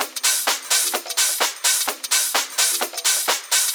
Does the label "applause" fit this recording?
No